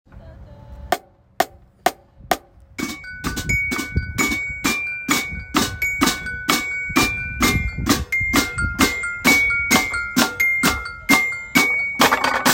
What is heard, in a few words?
theme song drumline; michael myers